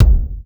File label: kick drum sample a sharp key